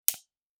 Lighter Click; SoundsCrate

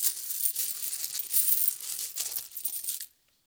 coins rustling; soundscrate

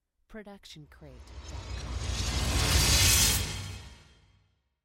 reverse breaking glass; soundscrate

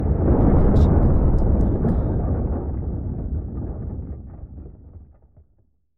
explosive debris distant; soundscrate

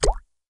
water

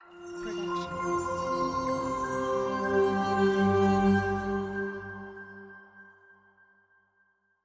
Inspiring Ident; SoundsCrate

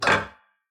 robotic click; soundscrate

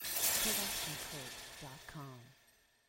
Odd Magic Effect; SoundsCrate

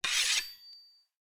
Draw Sword; soundscrate

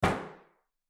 Metal Footfall; soundscrate

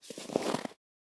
Snow Walking Single Step; SoundsCrate

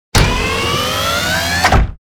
Robotic Movement; SoundsCrate

